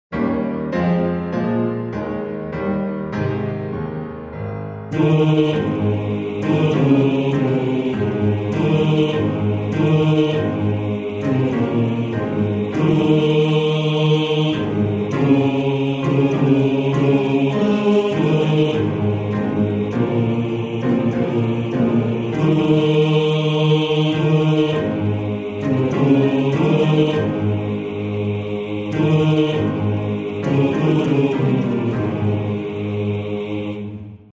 Männerchor Bass 2; Männerchor Elsau

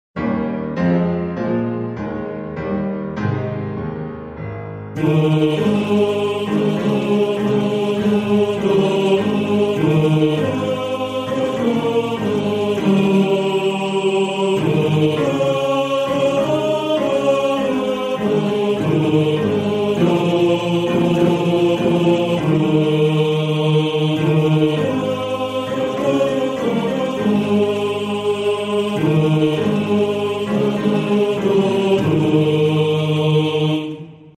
Männerchor Tenor 2; Männerchor Elsau

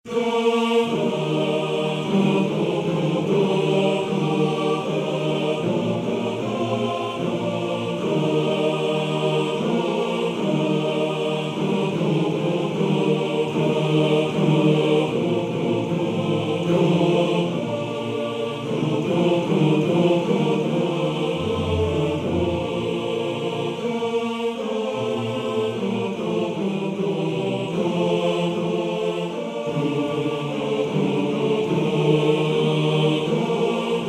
Chor; Mys Hüsli

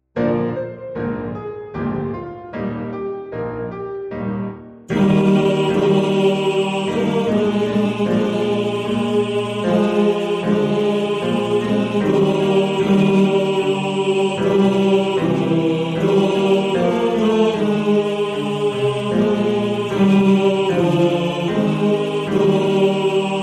Tenor 2; Santa Lucia

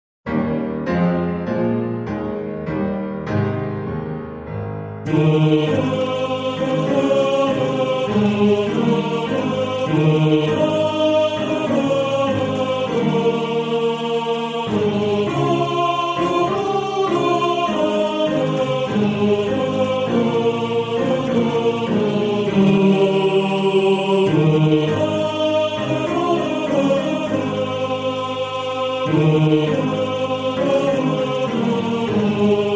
Männerchor Tenor 1; Männerchor Elsau

